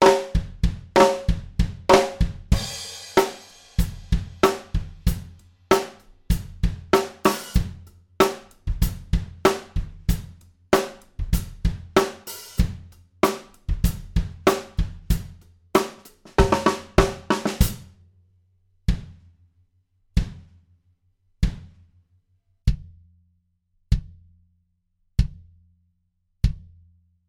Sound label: Kick, drum; drum shootout